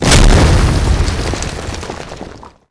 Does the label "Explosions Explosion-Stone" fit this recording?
Yes